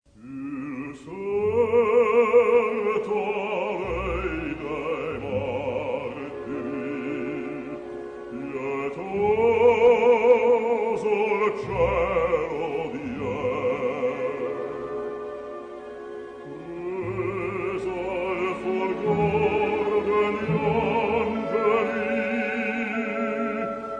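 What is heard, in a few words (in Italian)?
basso, registro vocale di basso è il più grave della voce maschile; frammento dell'aria Il lacerato spirito dall'opera Simon Boccanegra di G. Verdi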